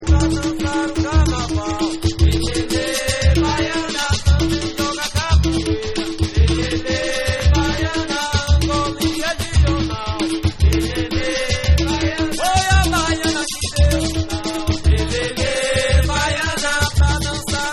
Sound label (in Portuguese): Samba De Roda